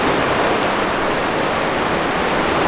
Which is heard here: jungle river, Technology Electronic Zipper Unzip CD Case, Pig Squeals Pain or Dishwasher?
jungle river